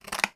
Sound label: office scissors cut cardboard single; sound effects one